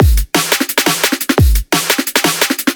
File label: Drum Breakbeat with Kick and Snare, drum and bass